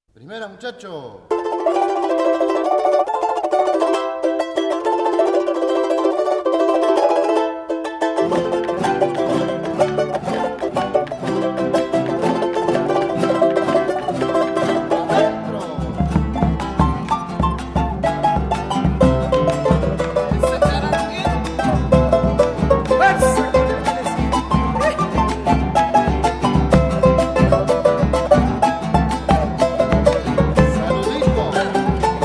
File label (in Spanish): bailecito calchaki